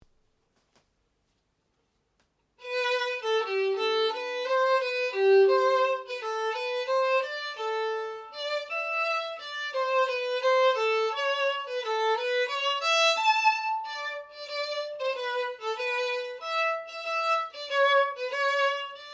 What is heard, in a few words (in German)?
Geige